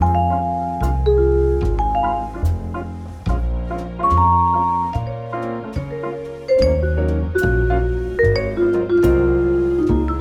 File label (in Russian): Fortepiano Pianissimo